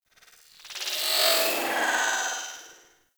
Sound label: Metallic Textures; Ambience; Warframe; Corpus Railjack